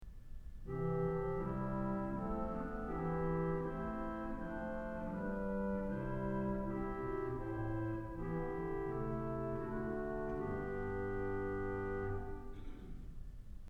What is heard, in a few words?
Corno; Kellogg Auditorium